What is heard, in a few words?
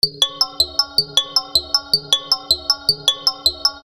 Buchla 200 Pings; buchla modular